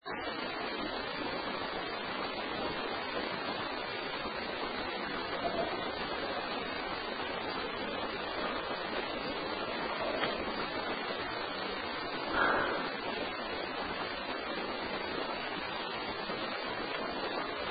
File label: Unexplained SCRACTHING; Case 023 Major Graham Mansion; Master Bedroom; BREATH